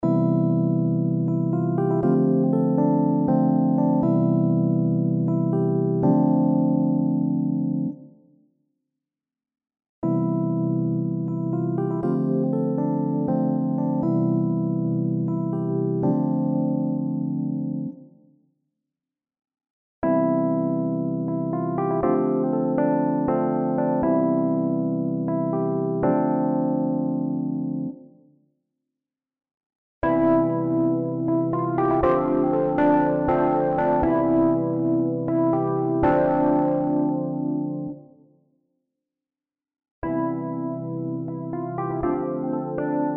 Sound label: Pianos; HIP-HOP Producer; Lounge Lizard